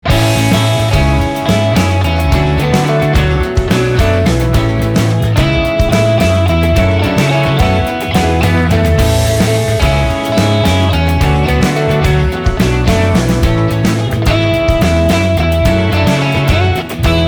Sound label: Primary Colours (Instrumental)